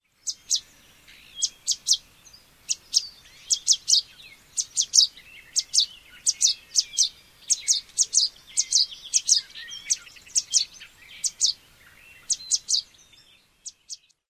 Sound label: fledgling calls